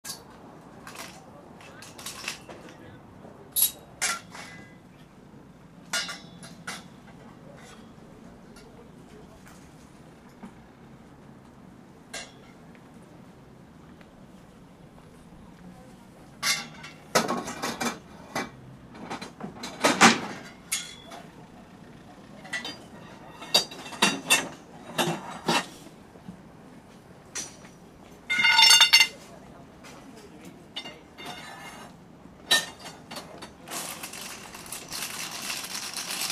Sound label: shambles